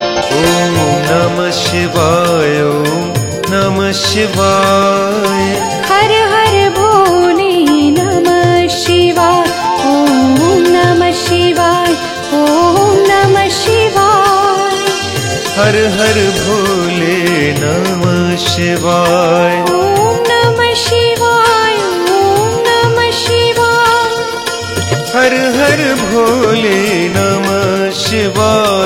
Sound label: Om Namah Shivay Chants